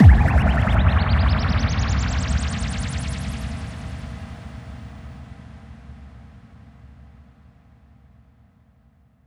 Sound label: Bassdrums FX, FX Kicks; Vengeance Samples